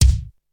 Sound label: treble rich kick drum c key